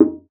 Conga; ProSamples vol.42 - Session Instruments

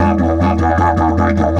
150, DIDGE RIFFS; Big Fish Audio - Didgeridoo and Other Primitive Instruments

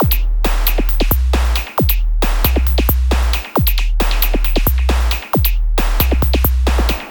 Beat, Drums, Eclectic Electronica

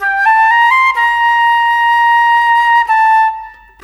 Flute, Orchestral Series Rock and Pop

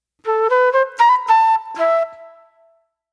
Soul Plate Flute

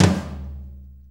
6-8 Tom Flam, Drum Loops; Sonic Foundry (Sony Creative Software); Loops & Samples Drum Tools; Flams

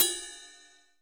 CR80 CYMB, RIDE CYMBALS; Crypton - DrumBase5000

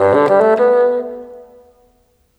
Bassoon, Orchestral, Rock Pop

